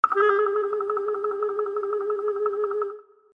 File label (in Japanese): 泥棒さん急げ！